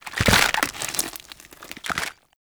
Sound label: Infested Hub Vendor Emerge Move Foley; Props; Warframe; Infested Eris